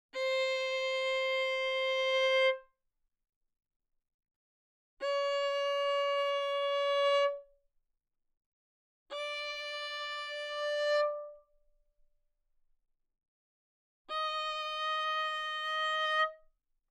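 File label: Violin arco fortissimo sul A C5 B5; Strings; violin2012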